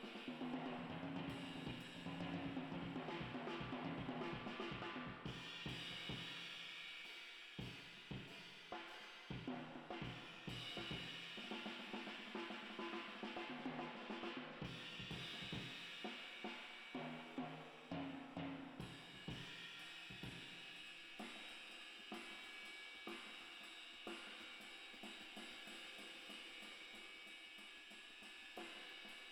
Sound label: Spring